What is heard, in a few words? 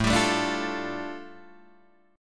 A 7th Suspended 4th Sharp 5th A, Guitar Open D